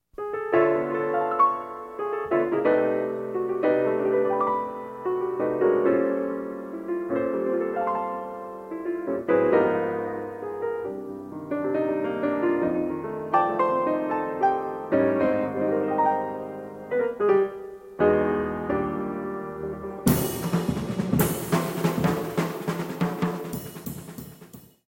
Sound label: piano intro